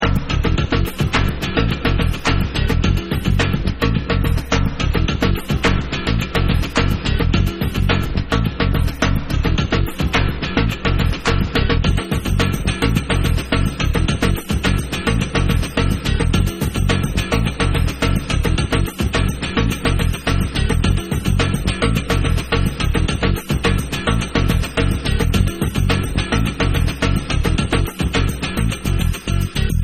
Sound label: Bonus Beat